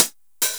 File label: Roland909